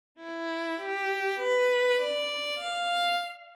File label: G-Clef Lines